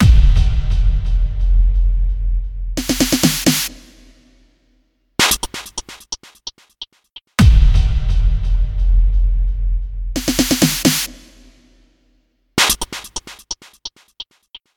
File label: 130bpm Experimental Beats